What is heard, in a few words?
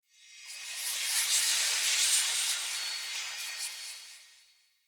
Smithy Soul Breath; Ambience; Soul Plane